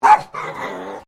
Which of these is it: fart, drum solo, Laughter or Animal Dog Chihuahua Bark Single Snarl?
Animal Dog Chihuahua Bark Single Snarl